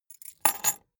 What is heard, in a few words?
key ring small place table